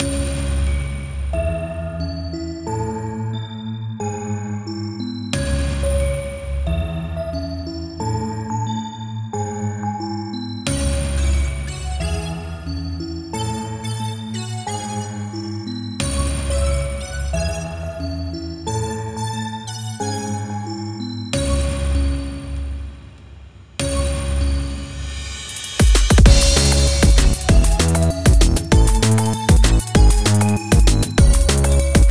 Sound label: Instrumental; Friday Night Funkin Daves Halloween Bash